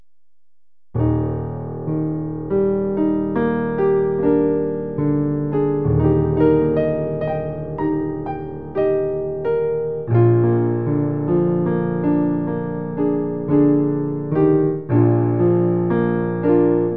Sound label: My Faith Looks Up To Thee, Piano